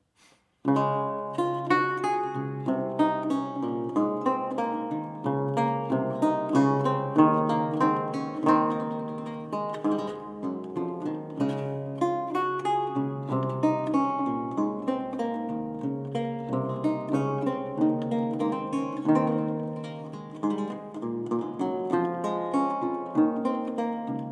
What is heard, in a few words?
Pieces in D Major Gavotte